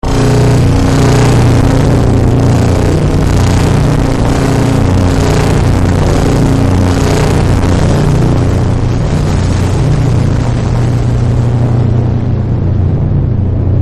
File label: nuke boom loud; vine